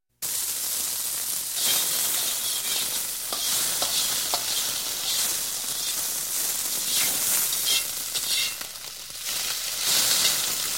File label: Cooking Effects